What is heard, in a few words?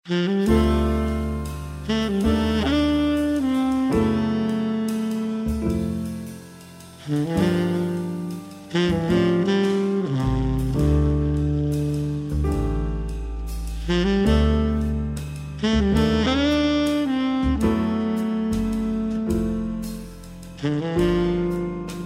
Laidback Jazz